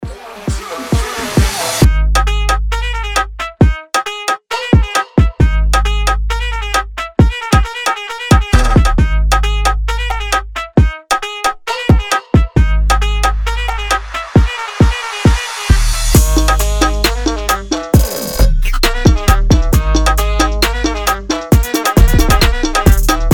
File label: latina trap